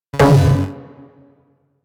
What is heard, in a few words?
Rifle Projectile Pre Explosion; Weapons; Warframe; Corpus; Crp Ruban Rifle